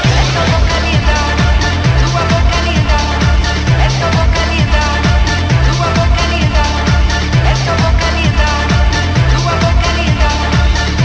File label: ritmo dynamic